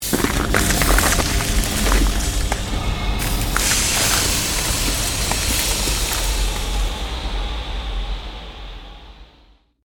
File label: CREATED MAGIC SPELL BLIZZARD SHARDS